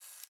tools scope; teardown; ps5 windows xbox series xs

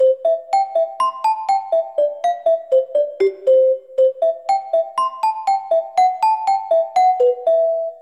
Celesta; Smeshariki Chiko's Computer

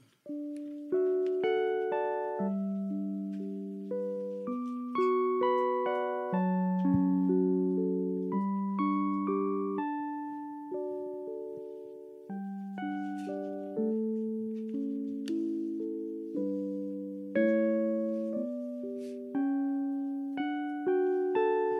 Instrumental - Live

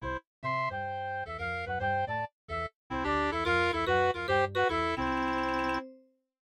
MIDI Animation stings

